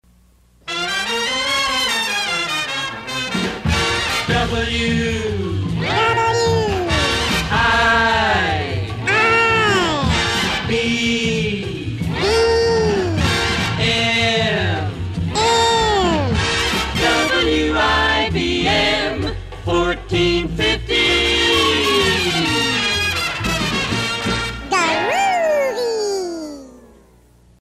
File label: Groovy